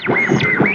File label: radio tuning; Voice SE; Nintendo; July 2020 Gigaleak